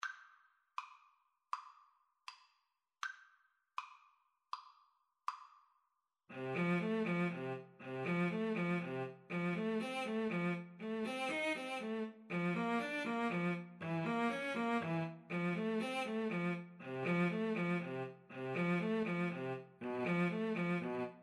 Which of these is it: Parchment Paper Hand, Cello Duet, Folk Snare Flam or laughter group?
Cello Duet